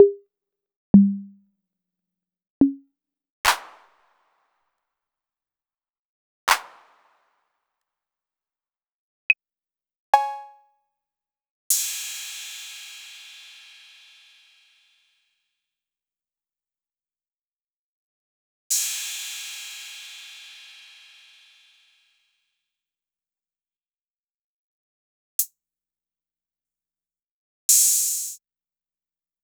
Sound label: Roland MV8800 DRM